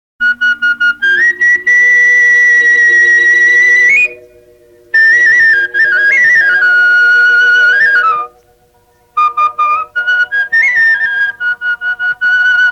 flute

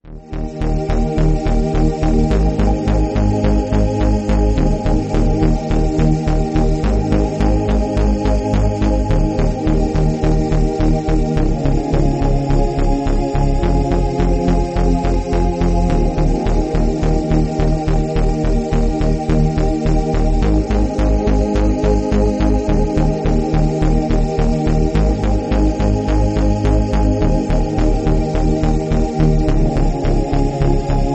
romantic

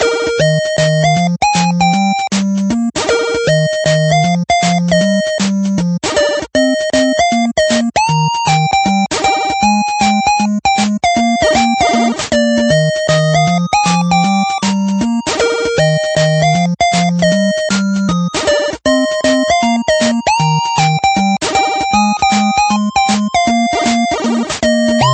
8bit

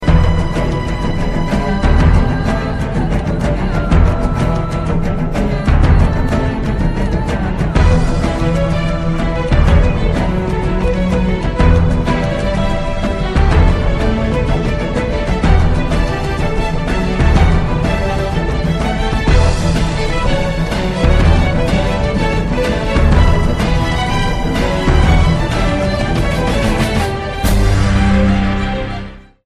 theme ringtone; halo

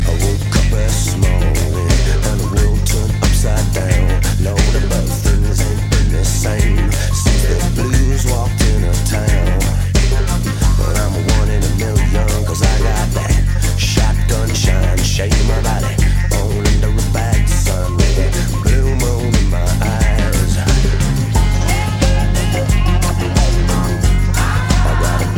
soprano

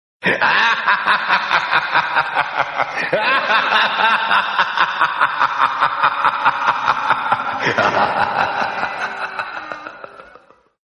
mad laughter

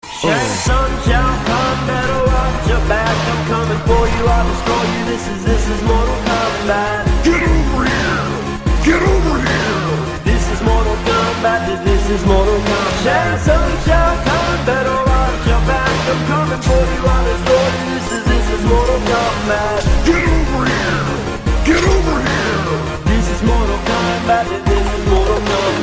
rap; mortal kombat

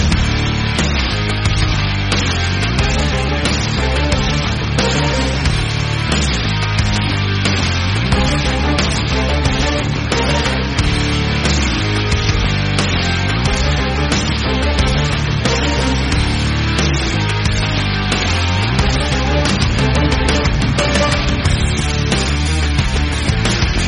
ringtones; god mode

